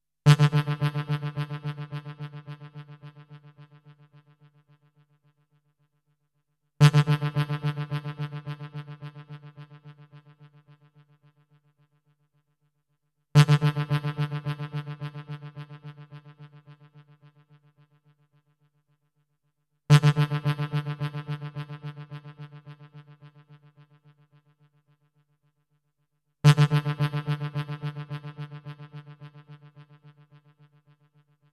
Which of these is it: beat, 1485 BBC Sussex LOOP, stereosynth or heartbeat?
stereosynth